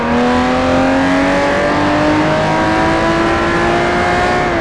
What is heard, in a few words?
second, vehicles